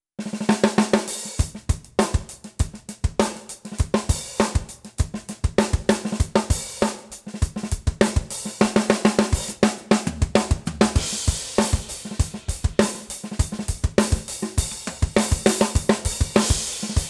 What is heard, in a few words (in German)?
Protean hoch mit Ring